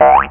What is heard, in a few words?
boink